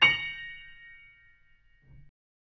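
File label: A sharp m highest, chords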